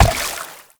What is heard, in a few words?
Step Water, Movement; Uriel; Warframe